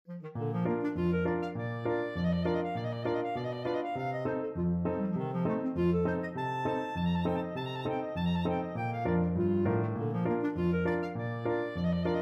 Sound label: clarinet polka